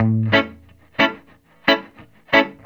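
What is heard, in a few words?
reggae electric guitar A; Best Service Advanced Media Trax Modern Composer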